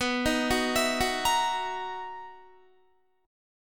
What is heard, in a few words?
B Major 11th B down Guitar; BM11 arpeggiated